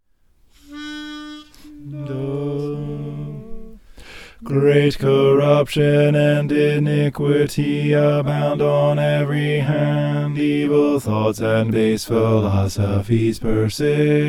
bass

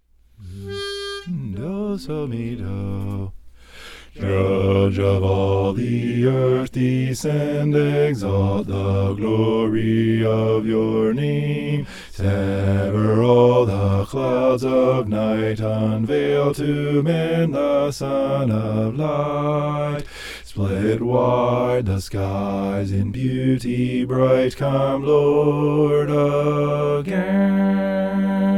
bass

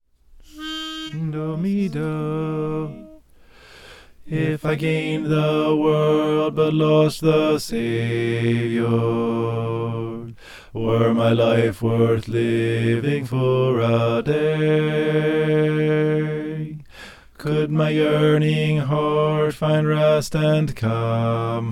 bass

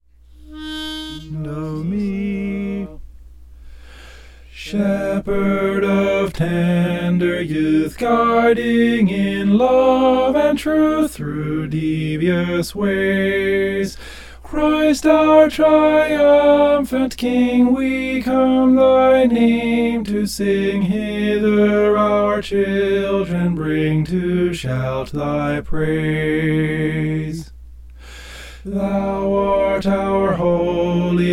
shepherd of tender soprano

